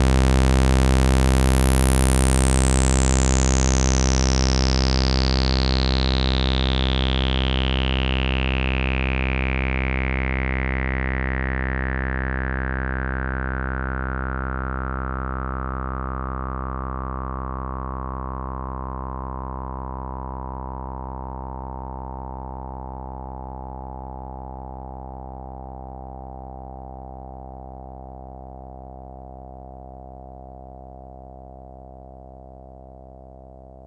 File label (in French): enveloppe max saw; minimonsta; MiniMoog